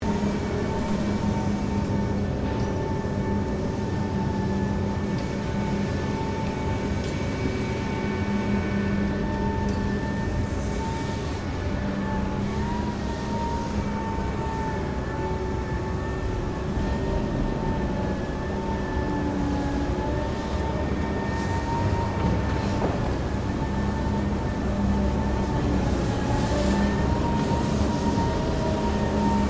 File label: Sweeper Street Small Open Cabin Operating; Construction Vehicles